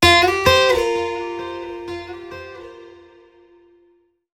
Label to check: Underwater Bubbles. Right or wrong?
Wrong